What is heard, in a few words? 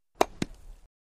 Baseball Bat Alumin; baseball fx & games